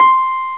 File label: Bright Piano (C5); X-Wing; X-Wing Patches